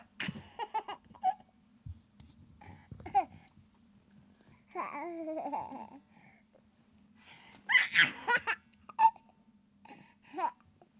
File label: laugh sound